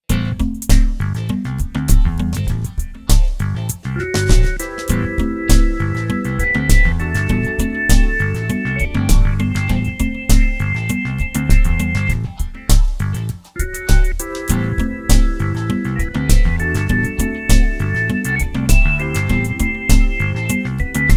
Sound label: difficult folk